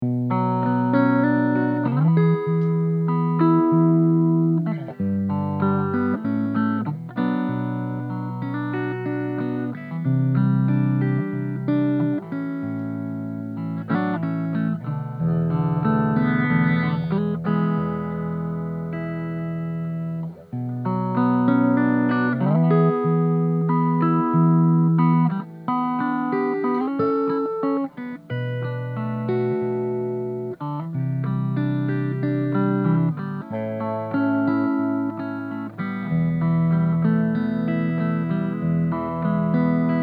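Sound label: clean middle, Clean – Fingerpicked, solid body Les Paul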